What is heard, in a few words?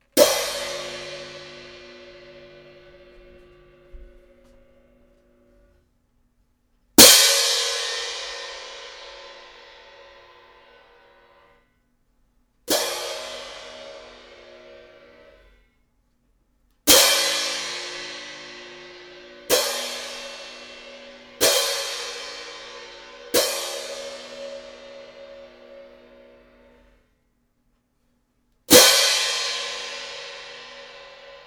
cymbals